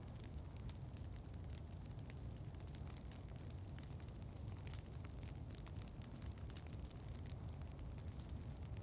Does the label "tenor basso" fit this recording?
No